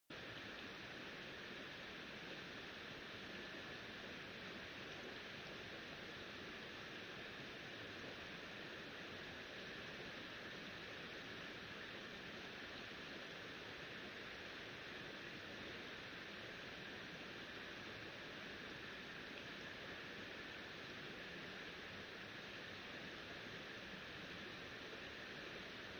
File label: riverside; Kyoto U; Ashiu